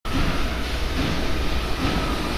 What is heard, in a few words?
dumping ore